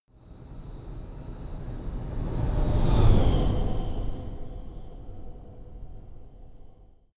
sci-fi vehicle train passing long; raumschiff

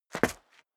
step dress boots concrete grit single stair down; Footsteps; schritte

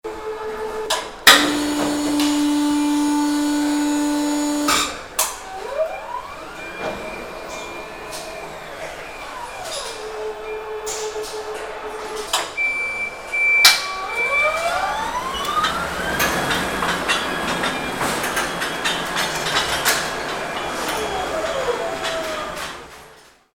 robot for assembly; atmosphaeren umwelt

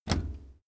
bicycle brake mechanics release

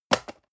storage fall table hard, box; objekte

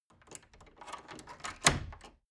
wood door old lock handling

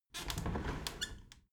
window handle squeak turn open halfway soft

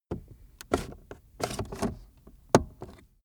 car accessories cup holder cover open slow

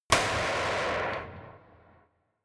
gun slapping pop gun distorted

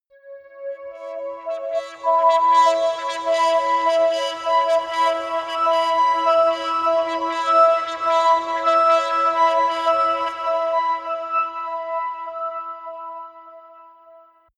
atmospheric feedback pad high, drones; musikelemente

